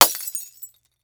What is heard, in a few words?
Crash Light Bulb; Lights; Break; HauntedBloodlines; SFX Producer